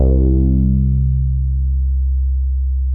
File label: bass, 162 BPM, Drum & Bass; Ueberschall; Drum & Bass Resonance